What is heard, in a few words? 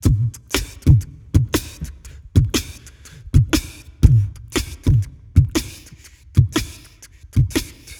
man beat